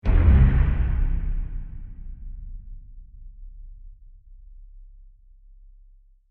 Trailer Impact Musical Ominous, Ominous Reveal Scifi Scary Sinister Dark Suspense Evil; Trailers; Sound Design